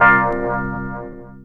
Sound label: RHODES; E-Lab; X Static Goldmine 2